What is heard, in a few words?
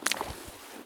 prone puddle; Escape from Tarkov